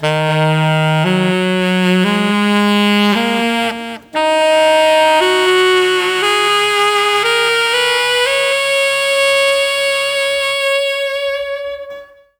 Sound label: Bridge Sax; Multitrack; Remix Parts